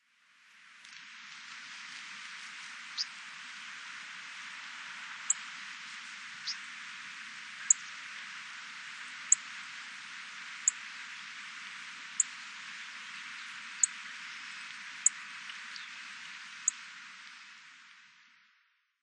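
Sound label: Japanese Reed Bunting; koe; utsunomiya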